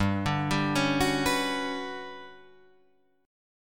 G Major Sharp 11th G down Guitar Drop D

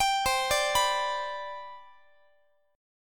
C Major 7th Suspended 2nd C down Guitar, chord; CM7sus2 arpeggiated

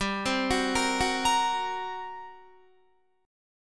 G Minor Major 7th Double Flat 5th, G, Guitar; GmM7bb5; arpeggiated; 15,15,16,15,18,17

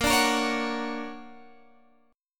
D Augmented Major 7th A sharp, guitar

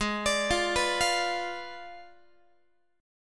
G sharp Diminished 7th G sharp, Guitar; G#dim7 arpeggiated; x,16,17,15,15,16

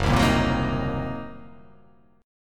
Fsharp 6th Flat 5th down, chord

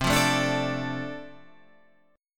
D-Minor 7th C, chord

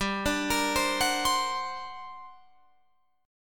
G Minor Major 11th, Guitar, Open F; GmM11 arpeggiated; 19,21,22,19,21,19; JGuitar

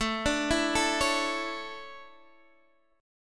D Major 7th Suspended 2nd

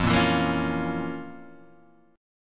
F Major 7th Suspended 4th Sharp 5th

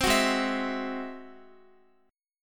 Ab Augmented 7th Ab down Guitar; Ab+7 strummed; JGuitar

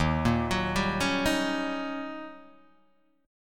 D sharp 7th Sharp 9th, Guitar, Open D

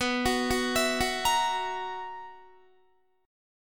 B Major 7th Suspended 4th, Guitar; arpeggiated

